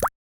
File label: Water Drop Notification; Xiaomi Redmi-Note-6-Pro